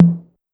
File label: Tom Lo, Pitched Up; Clavia DDrum-AT